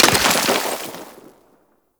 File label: Trench Small Dirt Impact; Warframe; Tau